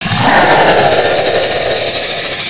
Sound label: Fury Bowser Roar, One of Fury Bowser's roars